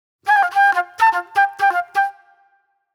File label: Jammy Flute